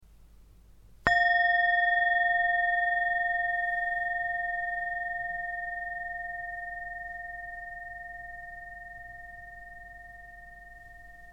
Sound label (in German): Klangschale